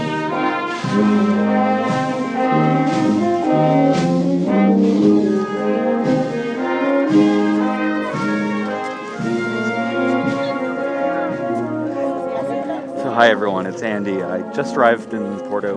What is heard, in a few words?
welcomed by a brass band in Porto